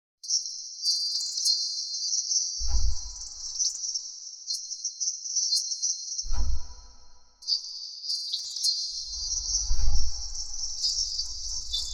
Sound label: Singing